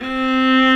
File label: Vla C3; Digital Sound Factory Virtuoso